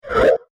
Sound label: Fast Abstract Frog Whoosh; Andrew Fleming